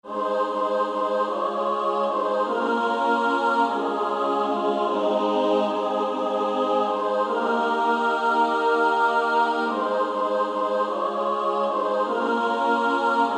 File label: Female Choir SSAA; Only a step to Jesus